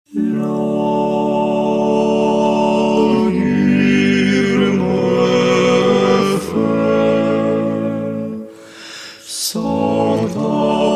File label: Voice Quartet; Languir me fais